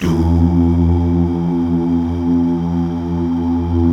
Mal Duh; Best Service Gigapack 1

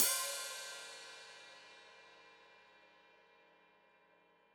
Hat Foot Open, Hi-Hat; Deeply Sampled Drums

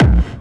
hardbassdrumz, breakcore; Bazzdrumz

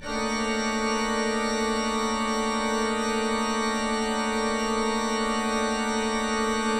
drone, Pipe Clusters; E-MU Producer Series Vol. 5 – 3-D Audio Collection; 3DSFX

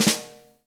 Reg. Snare Ghost, Roland BK-5; Snares

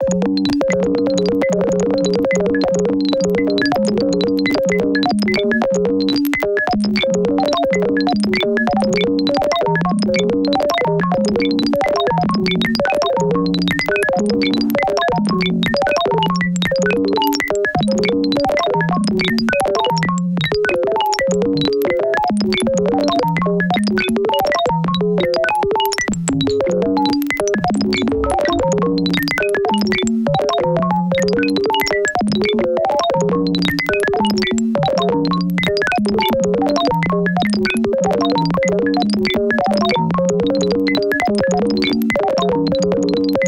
texture light crystals

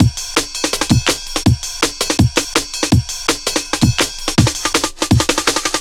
165 clean ride, Drum Loops, Drum & Bass; Total Drum&Bass

